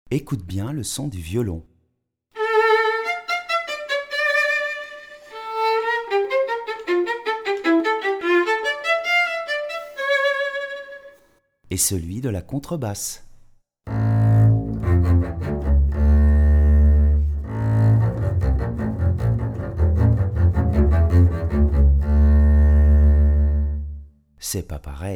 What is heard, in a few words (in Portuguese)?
Violino vs Contrabaixo